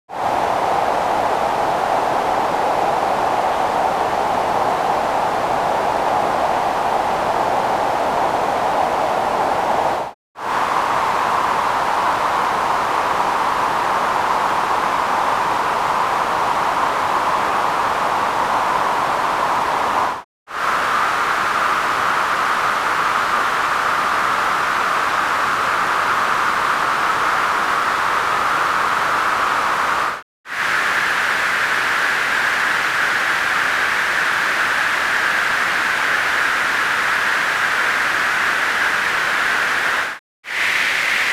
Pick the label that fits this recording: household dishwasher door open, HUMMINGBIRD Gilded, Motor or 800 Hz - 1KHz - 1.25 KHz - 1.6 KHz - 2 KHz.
800 Hz - 1KHz - 1.25 KHz - 1.6 KHz - 2 KHz